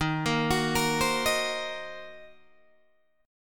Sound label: D sharp Minor 6th D sharp, down, Guitar, Open D